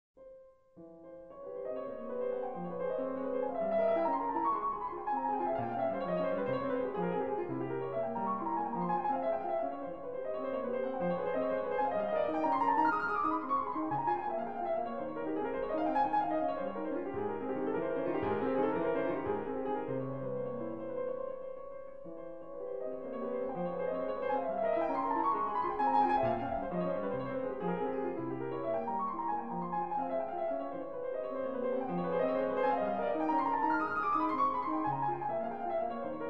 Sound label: Etudes in F minor, Piano; Chopin